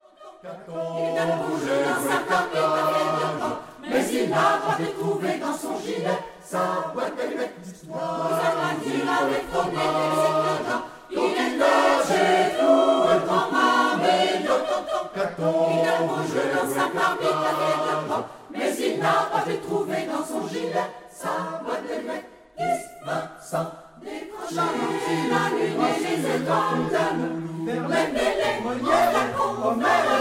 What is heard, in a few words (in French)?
chorale; voix rebelles meli-melo